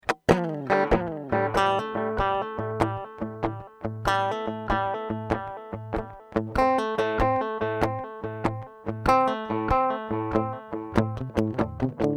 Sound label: Long Delay